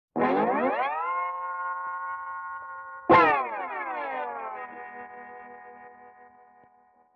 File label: Guitar Slide Up Down; Vintage Cartoon; Musical Elements